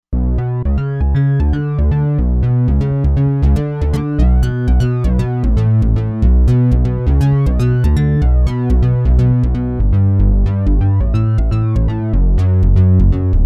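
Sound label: Bass Moonbase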